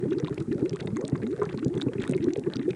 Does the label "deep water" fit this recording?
Yes